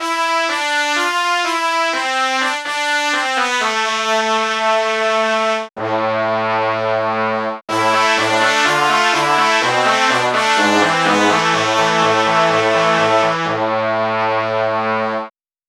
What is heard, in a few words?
brass section